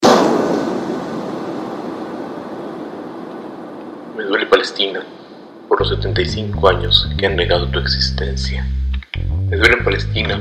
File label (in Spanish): jingel palestina